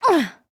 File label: ugh; celestia